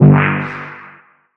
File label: Synth Bass 1G